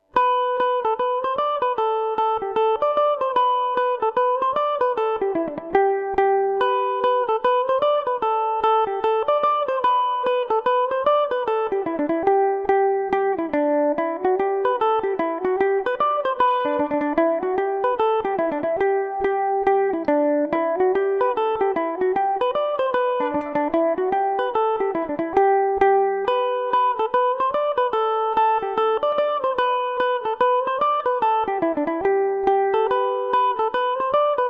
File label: mary brennans favourite gmaj, mandolin